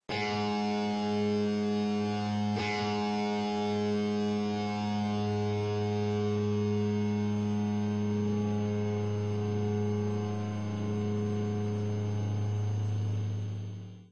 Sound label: heavy metal tune up 5th